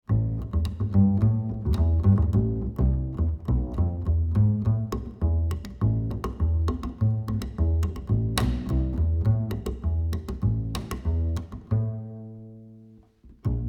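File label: acoustic bass Plucked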